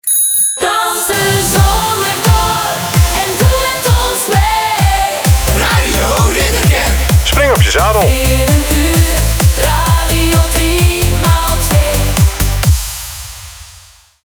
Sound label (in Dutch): Uur Opener; Radio